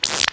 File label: frog aggro